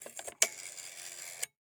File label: Telephone Rotary Dial; 1001 Sound Effects